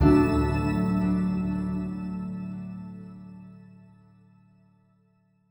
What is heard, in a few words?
Stingers Sting Gain